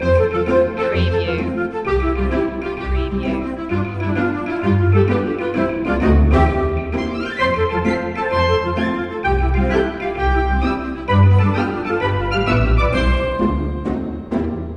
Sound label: playground of happiness loop